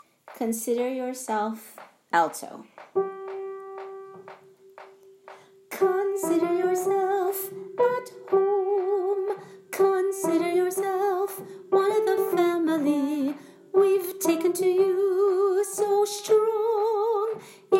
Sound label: Alto; Broadway Shabbat